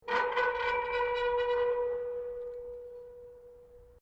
METAL CREAK LARGE; Materials

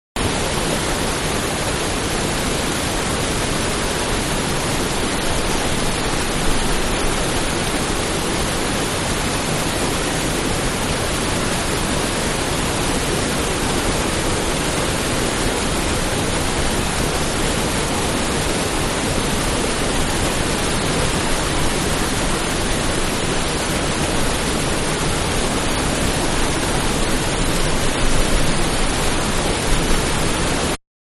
Waterfall Small; Rivers; Nature

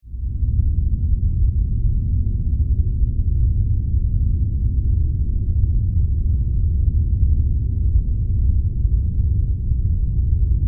Drone Rumble Dark; Sound Design; BlastWave

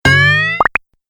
Cartoon Stretching And Popping; Cartoon Sound Design Sound Effects

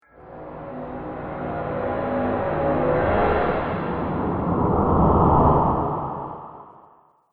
Science fiction Film Space Breath Riser

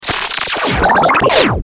Stinger Blurps Hit Zap; Production Element Imaging Element Accent Transition; Blastwave; Stinger Sound Effects

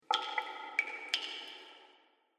Water Fantasy Cave Drips; Dripping Sound Effects; Agcnf Media

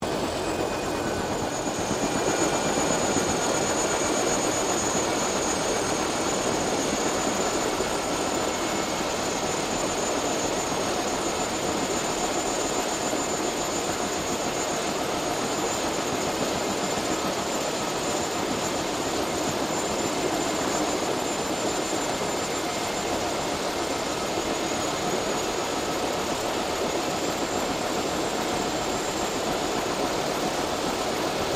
HELICOPTER BELL UH1 IROQUIOS SHUTDOWN; RAN UH-1 Iroguios; Helicopters (Sound Effects:Vehicles)

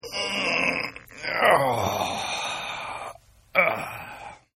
Groan Male; Groaning; Partners In Rhyme

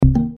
Multimedia Button Low; Buttons; Technology; Blastwave

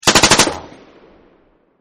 M2 Burst, M2 Machine Gun Burst; M249 Machine Gun Burst 2; Machine Guns; VRSonic

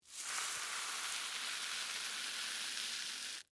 Scrape Cereal, Corn, Flakes; Texture; Scrapes (Sound Effects:Objects); BlastWave

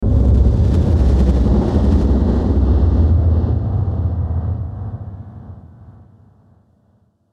Dark Sweeper Delay; Blastwave

